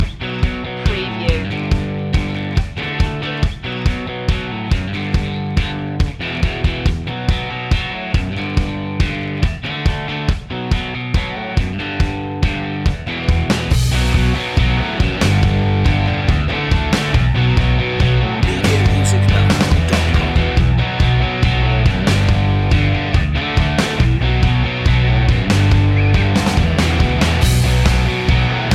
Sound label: blues rock out